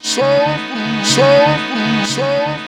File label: soulboom